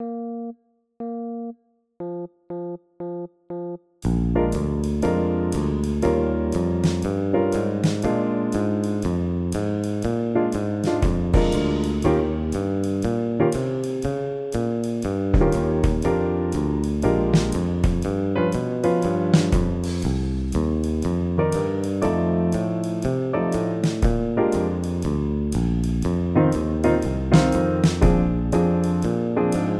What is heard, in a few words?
swing rhythms backing track with count in